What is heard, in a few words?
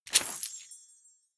medieval collect loot light, swooshes swishes